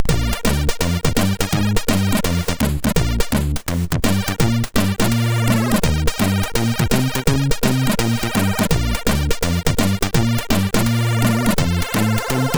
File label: Intro, sid MOS6581R4; NATO